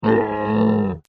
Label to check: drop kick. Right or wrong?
Wrong